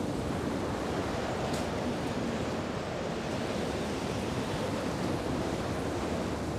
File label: Outside wind; zps; Ambient